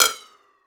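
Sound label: clap, drum machines; 300 Drum Machines; E-MU Orbit-3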